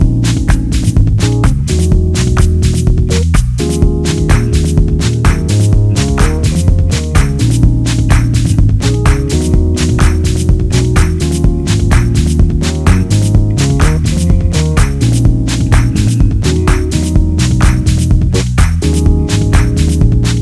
Instrumental Mix

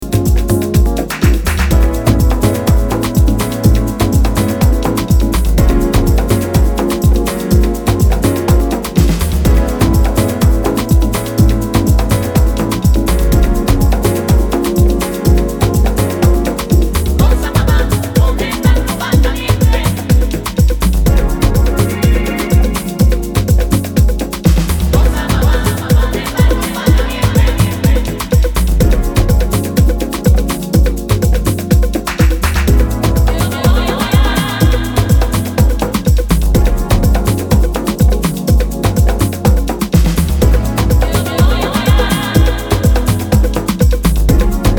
Deep Groove; house

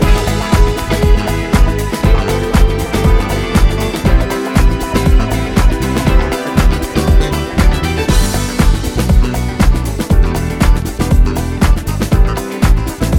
Remix Instrumental

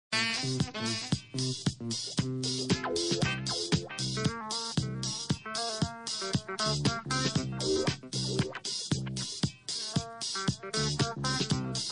disco dub band

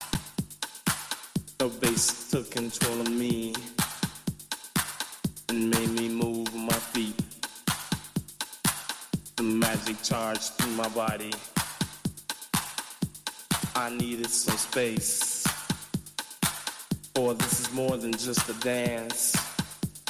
BASS

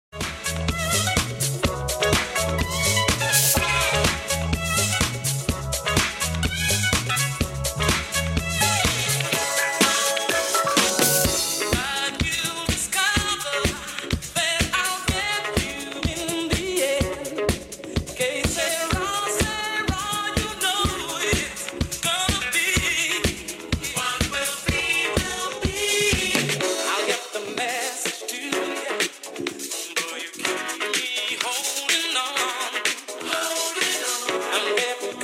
DISCO

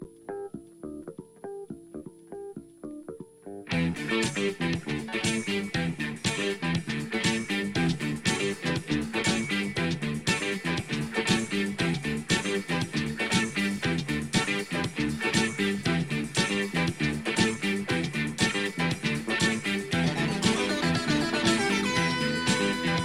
ritmo; italian tamburo

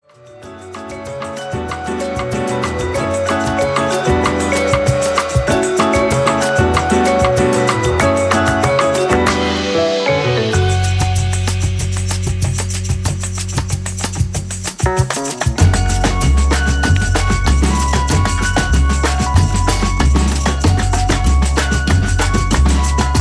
Samba

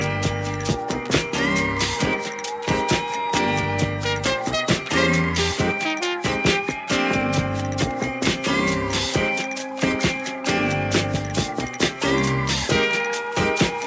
latin love song

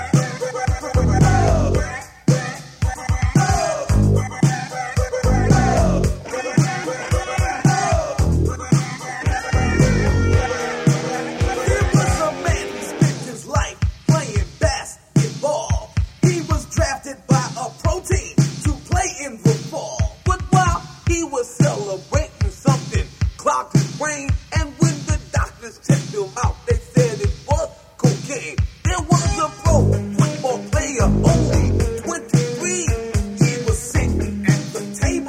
Instrumental

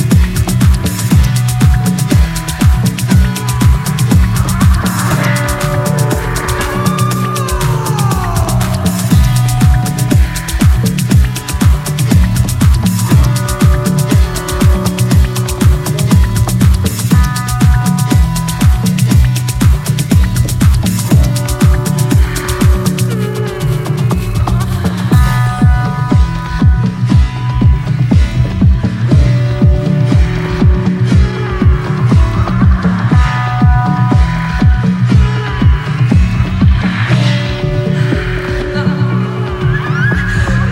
Afro Club Mix

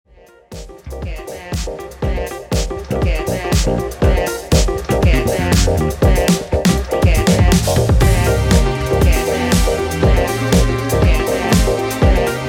STRINGS

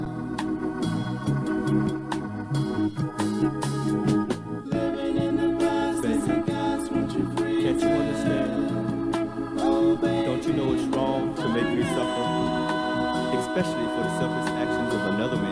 FUNK BAND

